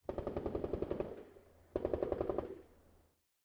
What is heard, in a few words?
ambient shot mono